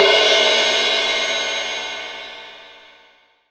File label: Crash Cymbal, 18INCH OLD MALLET